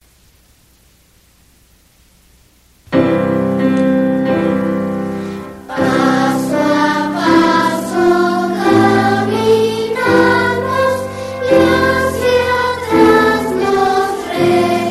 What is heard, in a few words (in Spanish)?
Piano; Primer ciclo; Paso a Paso